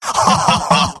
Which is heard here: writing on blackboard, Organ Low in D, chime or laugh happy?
laugh happy